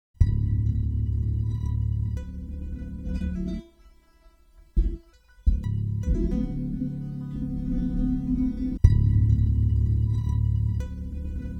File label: bass loop